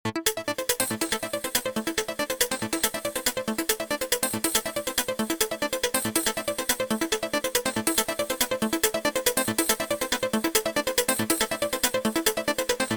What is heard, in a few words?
korg wavestation sr sounds drummer